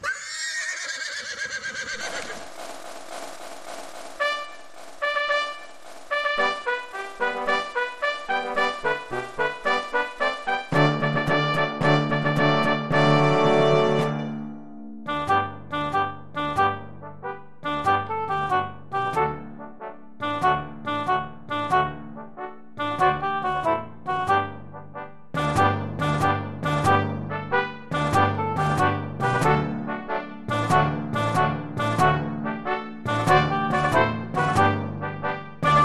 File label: Gallop accomp